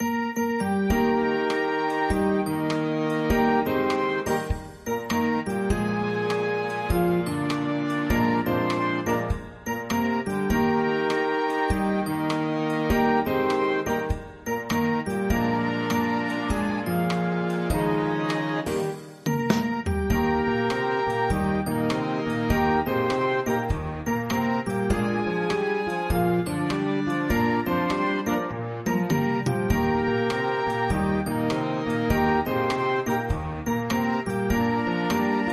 whole world BAND

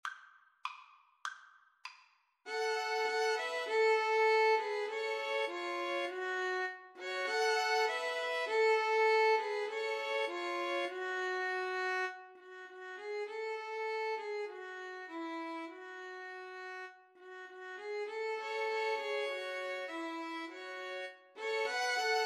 Violin Trio

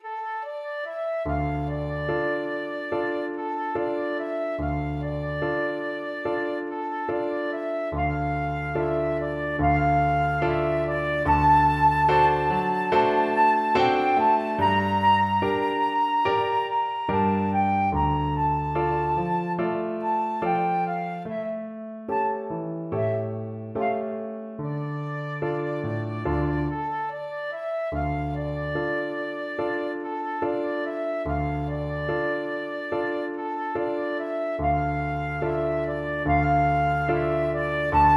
bella ciao flute

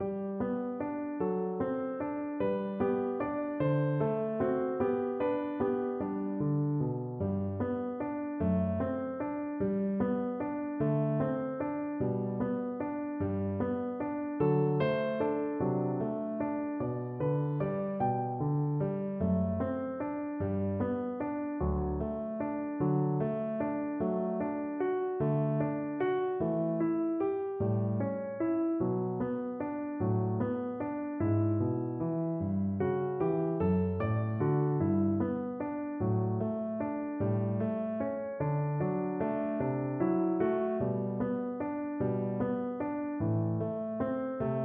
Viola

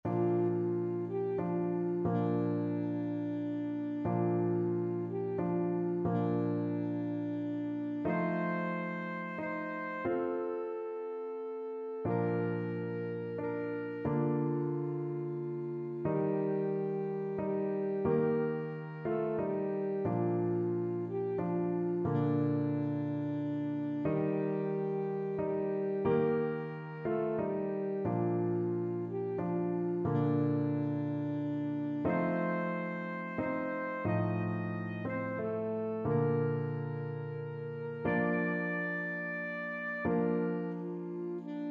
silent night alto, saxophone

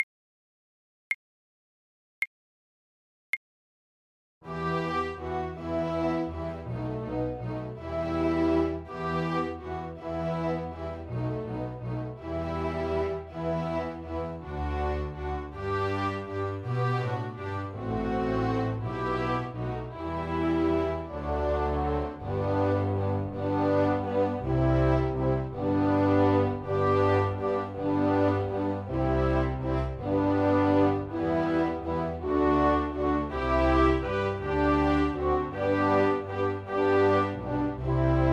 we three kings violin

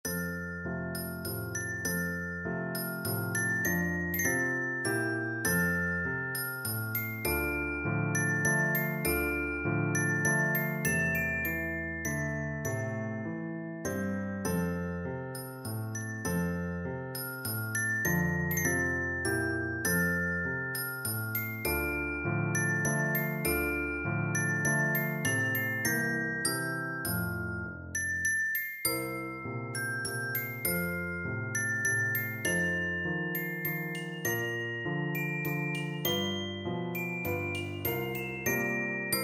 waltz, percussion; brahms

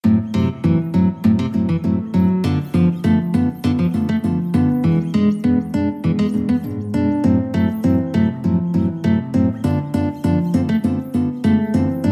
djangos stomp, guitar ensemble

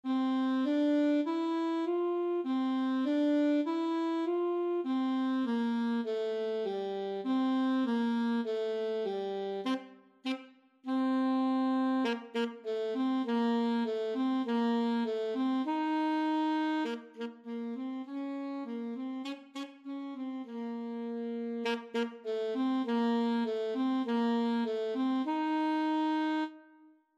saxophone

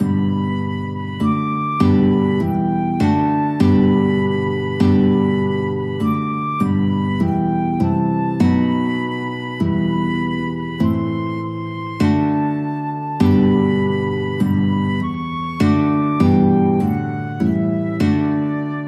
flute guitar duet

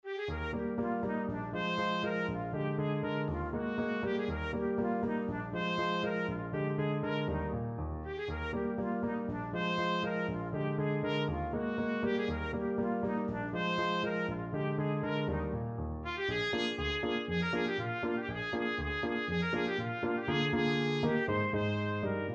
ecossaise trumpet; beethoven

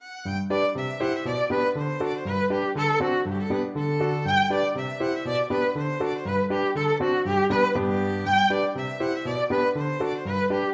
polka violin

